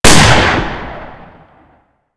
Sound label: 7MM Rifle Fire; Weapons